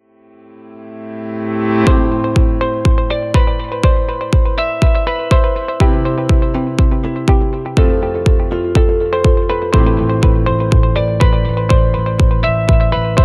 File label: Serious Corporate